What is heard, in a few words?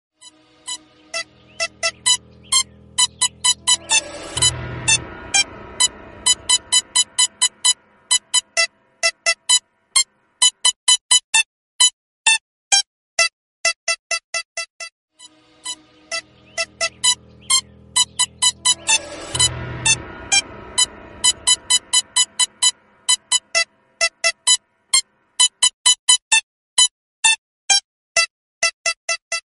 mobile instrumental song; Shaurya Goenka